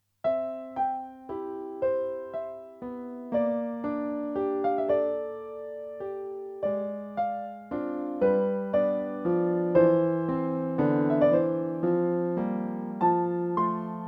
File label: Lullaby